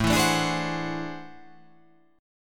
A Minor Major 13th, down

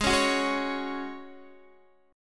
Ab 7th Suspended 4th Ab down Guitar

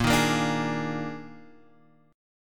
Eb Major 7th Suspended 4th Bb

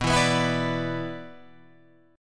B-Minor Double Flat 5th, Guitar Open G

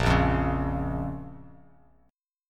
Bb Minor Bb down, Bass Standard, chord; strummed; JGuitar

